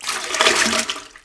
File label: Puke; Neighbours From Hell Console Edition; GC, Xbox